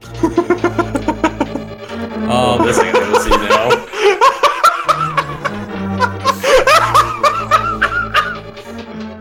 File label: laugh